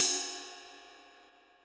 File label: Ride Rock, Yamaha DTXpress Cymbal